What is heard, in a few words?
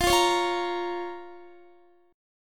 F Suspended 4th Sharp 5th, down, Guitar; strummed; 4F,4A,5C,5G,5C,6E; JGuitar